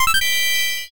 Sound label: notify superchat